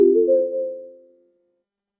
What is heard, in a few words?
synth pop